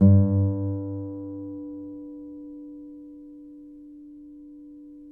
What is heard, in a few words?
NYL G, FLAMENCO NYL; Spectrasonics; Hans Zimmer Guitars VOL-2